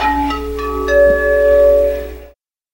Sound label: Chime; Royal Princess